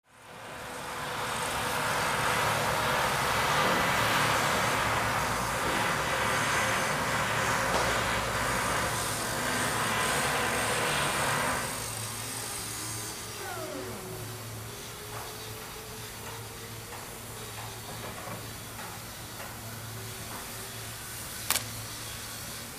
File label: Industrial Factory